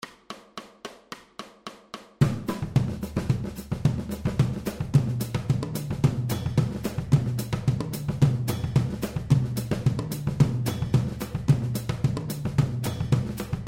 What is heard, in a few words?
Samba Batucada